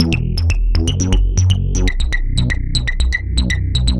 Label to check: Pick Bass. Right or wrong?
Right